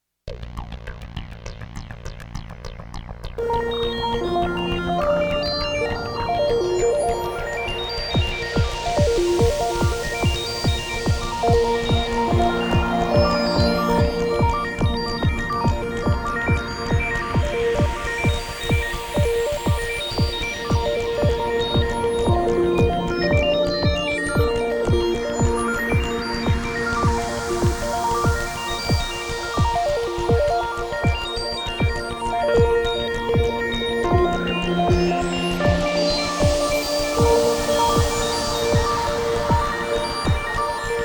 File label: space intro